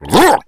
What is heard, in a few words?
rage, infected, male; Left 4 Dead 2; Source Engine